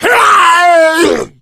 hunter pounce; player; voice; attack; source engine; left 4 dead 2

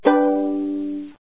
musica asterisk; windows 9x; sound schemes

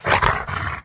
zombie dog growl

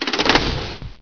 lever; buttons

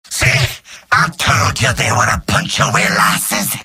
cheers; demoman; video games; source engine; tf; mvm

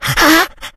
shoved, npc infected

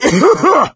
cough, player survivor voice; source engine; left 4 dead 2

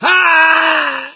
death scream, gambler, survivor voice; source engine; left 4 dead 2

